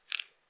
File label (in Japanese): 膣外射精